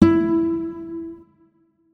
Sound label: notes, guitar; Hideout Furniture